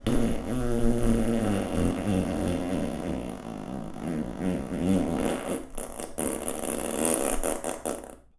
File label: fart